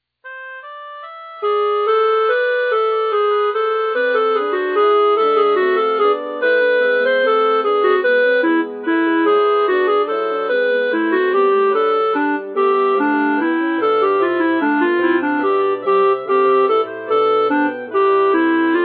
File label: alto; cantata 61